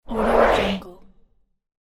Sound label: rich swish transition; fxprosound